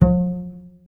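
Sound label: string contrabass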